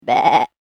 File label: Sheep - Animal Impersonation; Sheep Sound Effects